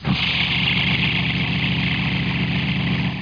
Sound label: grapple; weapons; Quake; PainKeep; PC Gamer 46